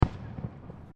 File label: Fireworks Single Firecracker; Fireworks (Sound Effects:Backgrounds)